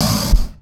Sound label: warbeast damage